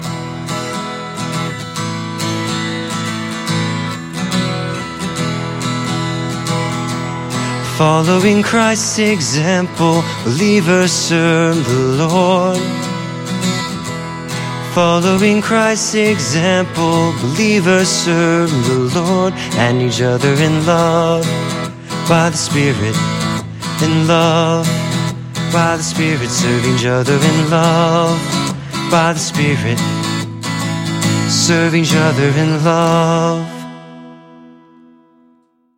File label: Music Only; Creed 33; Service